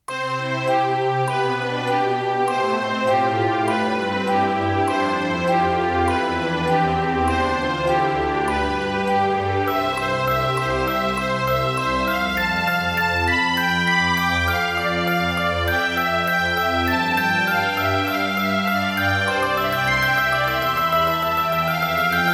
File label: Strings